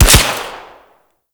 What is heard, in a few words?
Goo Gun Shot; Warframe; Grineer